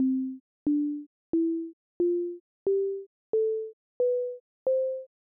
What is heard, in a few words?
synth sine